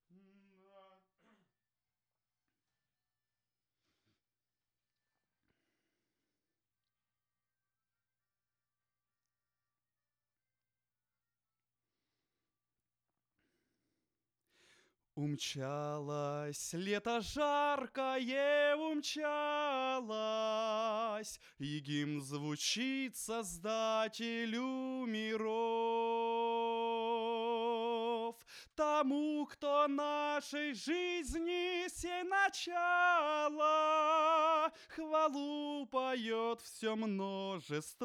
vocal

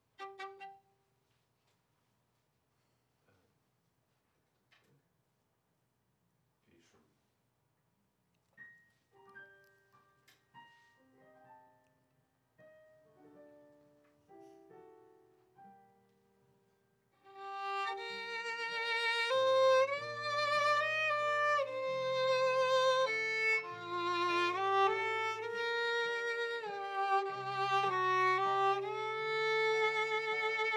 Viola

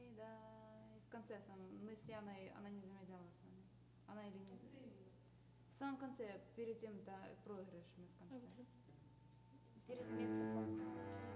Overhead

